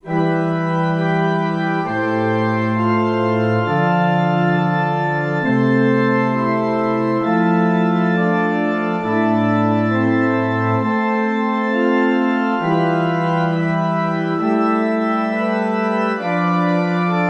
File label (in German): Stimmiger Contrapunkt; Ronge; corretto